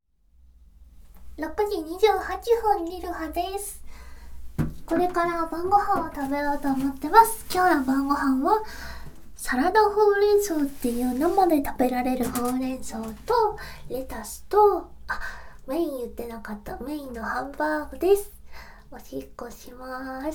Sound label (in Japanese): おしっこです！